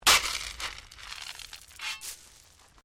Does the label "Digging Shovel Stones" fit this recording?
Yes